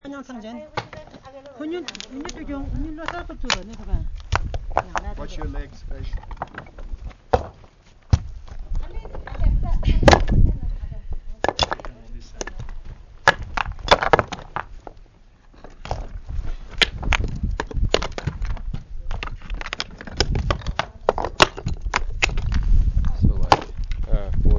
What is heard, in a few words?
rock toss; rocks; nepal; We had to lower a huge wooden pole that sat in the ground surrounded by rocks